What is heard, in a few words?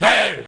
tree att; DAoC; Toa; Gamestar